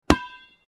dodgeball hit

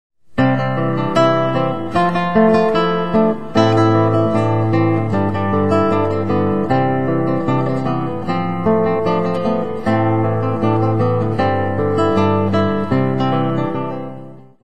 guitar

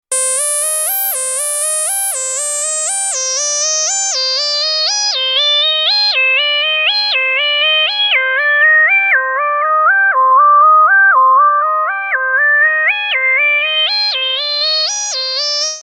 high pitched ringtone

